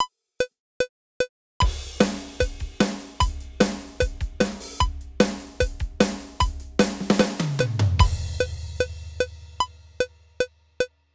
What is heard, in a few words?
Drums Quantized